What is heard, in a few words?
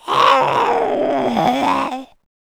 kid zombie idle; nmrih; childrenofthedead; zerosiki; slender